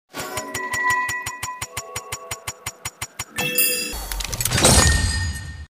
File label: spin